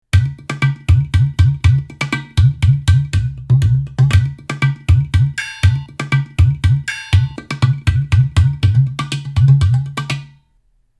Calima Ceramic Drums

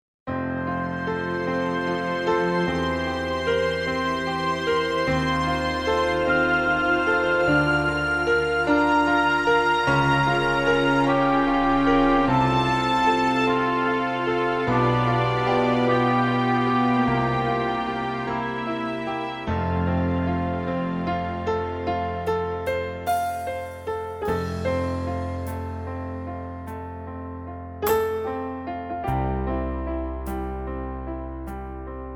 6/8 Style

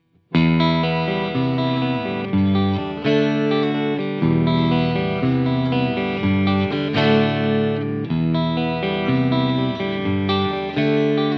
BC Rich Mockingbird Single Coil 3 Way Switch

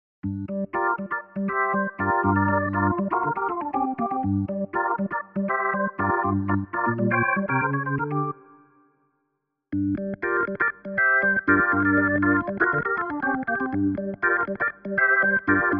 Shade Organ

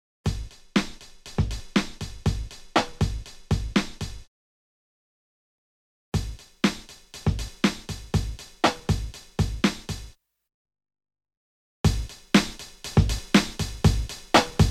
Drumloops

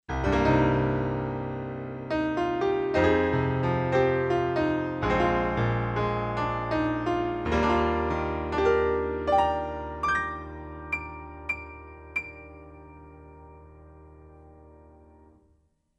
boesendorfer MOTU M4

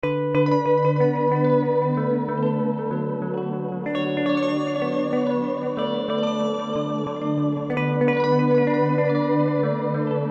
Delay; Specular Tempus; Hypnotick

